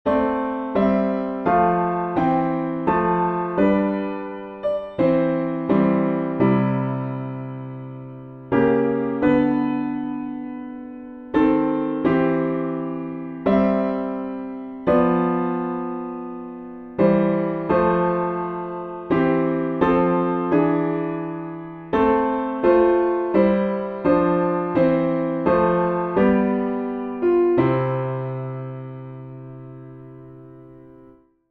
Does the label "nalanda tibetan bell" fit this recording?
No